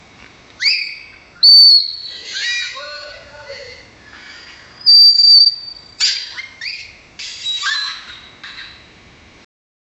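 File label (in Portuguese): tênis arrastando no chão; sport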